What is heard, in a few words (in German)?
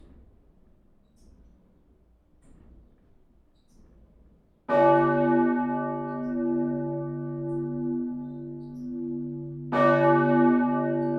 Glocke, Kirche Glocken; Unsere Kirche Glocken; Northeim; kg stsixti northeim